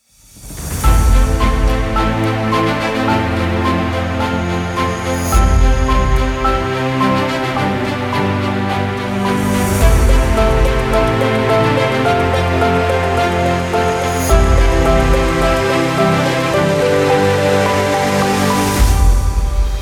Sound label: Background Music Inspirational Epic Music